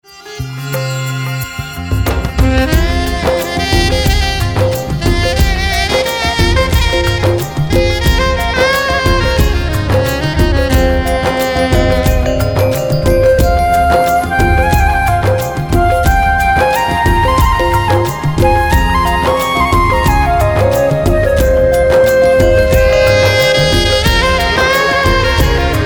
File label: Instrumental